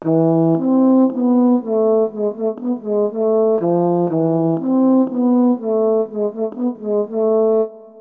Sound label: Tuba; Smeshariki Chiko's Computer